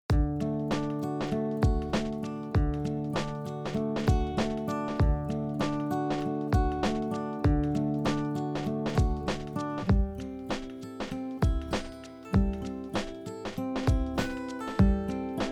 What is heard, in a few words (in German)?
Gitarre